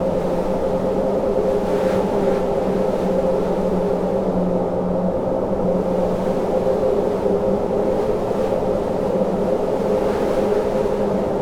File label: Big Wind Loop